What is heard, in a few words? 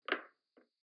footstep wood